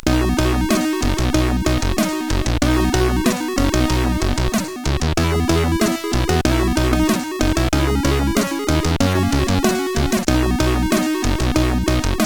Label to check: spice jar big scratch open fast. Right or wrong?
Wrong